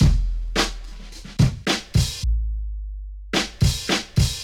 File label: Drum Break Hip Hop; Ableton; Breakbeats by KutMasta Kurt